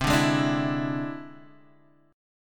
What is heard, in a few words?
E Augmented 7th C